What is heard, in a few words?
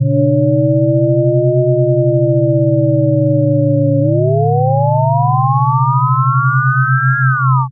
warble; nyquist